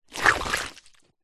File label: foley wood stick movement slide draw line in sludge wet mud squelch